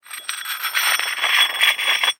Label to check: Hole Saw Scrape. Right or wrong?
Right